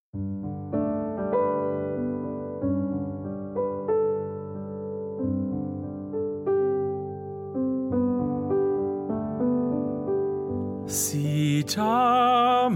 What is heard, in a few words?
Lullaby